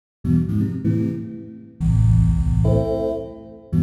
DEEPHOUSE ORGAN 125 A

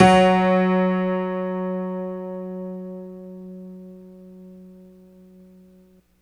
HONKY F#4; Orchestral; FL Keys; RS5 HonkyTonk

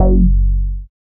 BASS MINIMOO

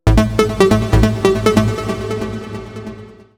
TECHNO EXTRA 140

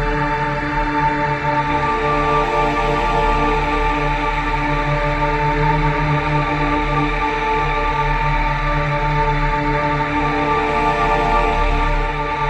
PAD

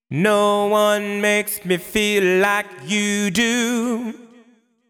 deep house male, 125, A, vocals

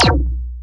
LO ZAPP; ELECTRIC-SET

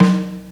JAZZ TOM, JAZZ DRUMSET

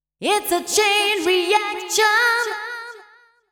deep house female, 125, A, vocals

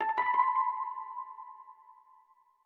ETHNO 90 D

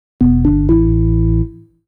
DEEPHOUSE BASS 125 A

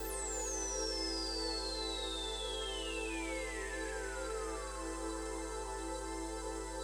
SPACE PAD 140 A; SpaceSounds

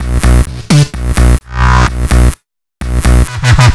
bass 128 Bm; Electro; bass loops; Anthemic

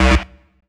dance chord

